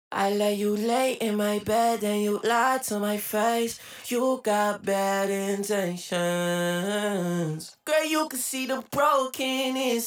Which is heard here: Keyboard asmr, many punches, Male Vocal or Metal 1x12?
Male Vocal